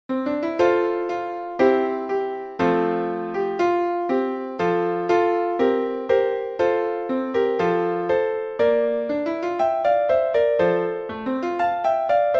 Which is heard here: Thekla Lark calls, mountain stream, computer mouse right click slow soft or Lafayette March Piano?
Lafayette March Piano